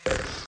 bop

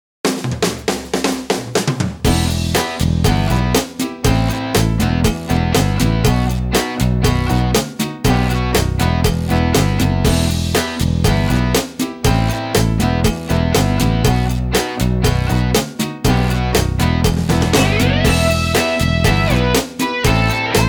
LATIN ROCK Short; cars mater national championship 2007 xbox 360 gamerip

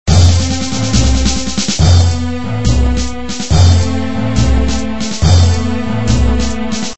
Music Loop; Heli Attack 2

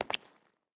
concrete wet footstep; gamerip; adams venture origins

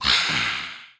monkey Roar; Temple Run

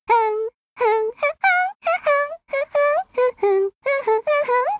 Humming; Hyrule Warriors Definitive Edition; Medli's Humming; Earth God's Lyric

